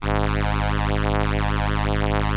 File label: ELKA, String Machines